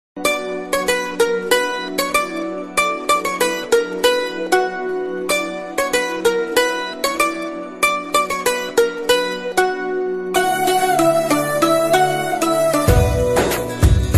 bgm ringtone in telugu; rock star bgm

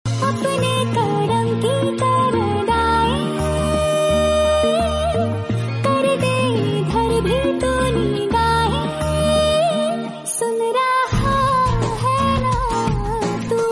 Hindi Song; Aashiqui 2

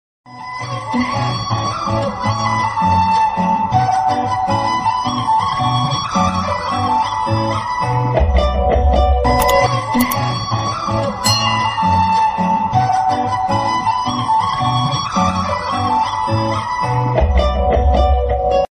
love bgm ringtone telugu bgm ringtones; pushpaka vimanam

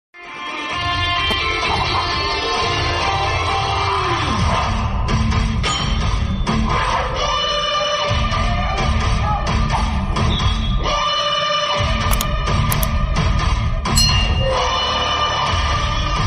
attitude ringtone; lakshya movie; naga shourya

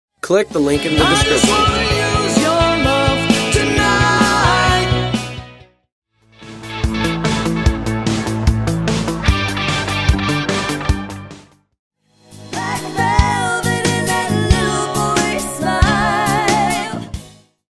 80s super hits ringtone

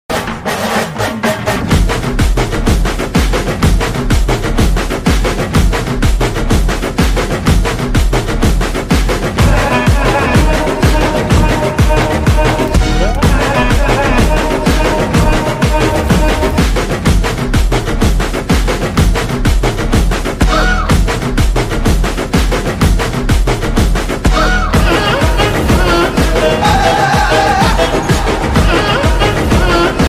bgm ringtone; mangalavaaram movie

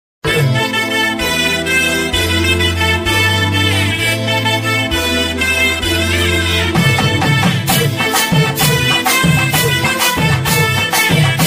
trending bgm ringtone; murugan; whatsapp

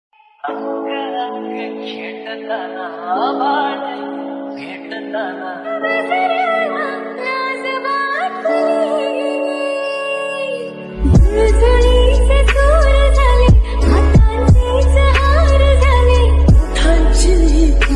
marathi ringtone

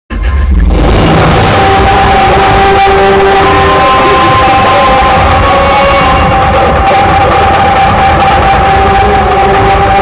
interval bgm ringtone; ambajipeta marriage band

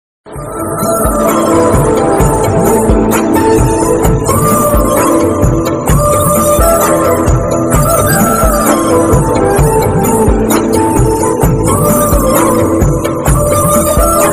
best love ringtone hindi ringtone

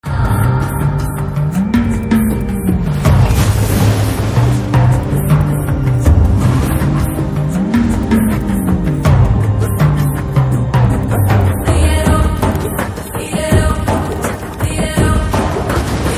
Motion Poster Theme Ringtone; RRR

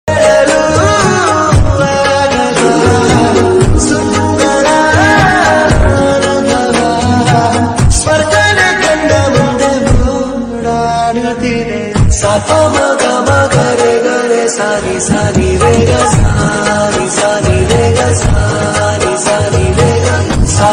tamil love bgm ringtone love ringtone

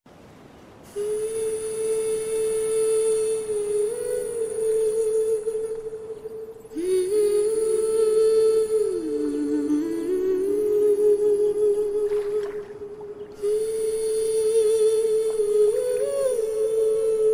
humming bgm

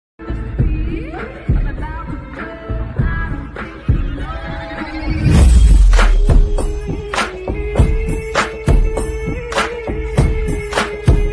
slow reverb ringtone

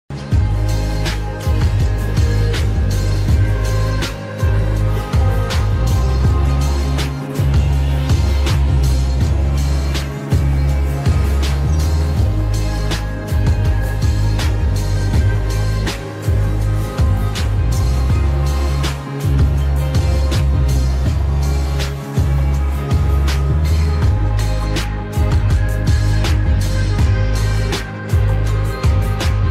ringtone instrumental

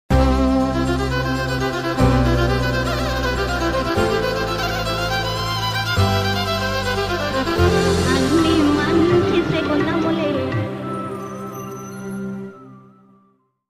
anni manchi sakunamule bgm; malvika nair

